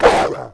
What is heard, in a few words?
wolf attack; The Elder Scrolls III - Morrowind; were